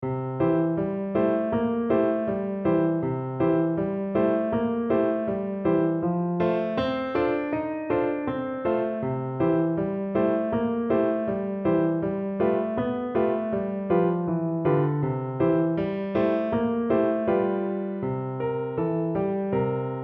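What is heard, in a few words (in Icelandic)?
gítar boogie; piano leikur 3 hefti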